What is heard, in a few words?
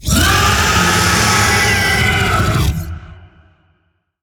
Vocal Attack; Warframe; Zariman; Void Angel